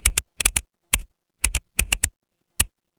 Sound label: office keyboard